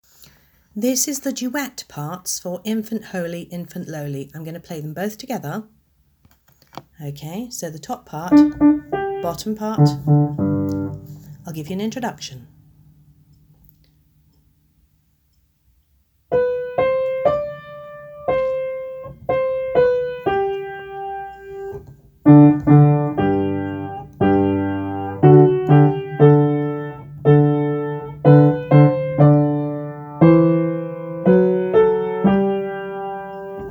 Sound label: duet parts